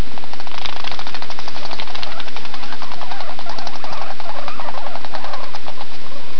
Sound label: Guinea Pig Angry